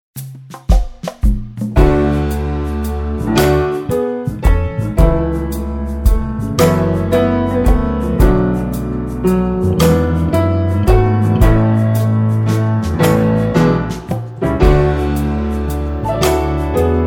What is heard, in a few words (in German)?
Klavier Soulballad